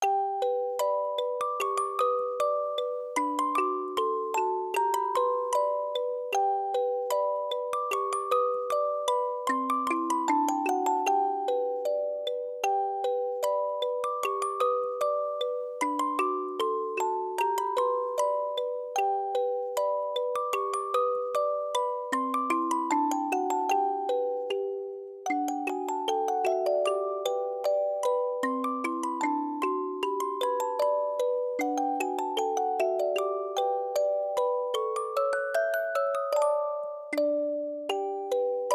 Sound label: Parade, Music Box